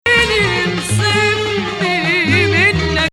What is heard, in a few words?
Nahawand